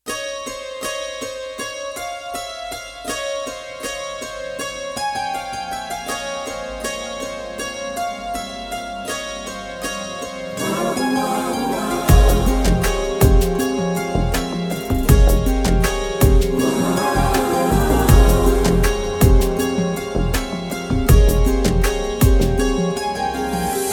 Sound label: Instrumental Tone Ringtone; Singham Returns Movie